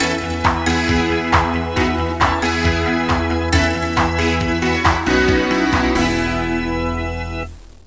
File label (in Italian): suonerie musica rock